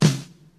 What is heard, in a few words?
tight lows acoustic snare d sharp key; tight lows acoustic snare sound; snare drum samples